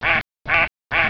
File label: mallard